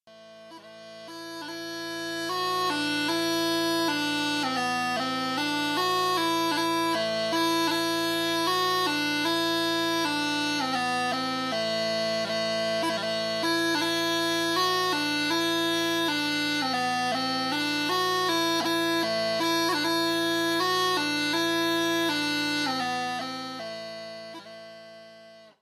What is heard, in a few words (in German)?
Smallpipe Mittelalter